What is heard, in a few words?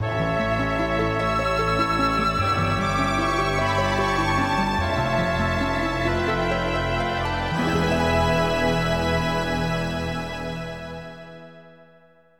Jingle